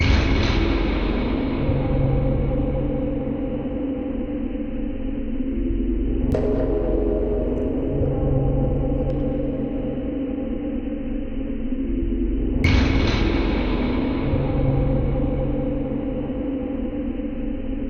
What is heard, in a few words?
cycler loop, ambience; svencoop; rngremake